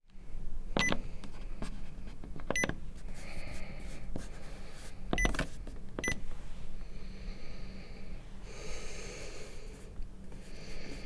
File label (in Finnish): sector alarm halytys pois ja ulos ovesta hengitysta